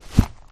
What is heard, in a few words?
jump; skyscape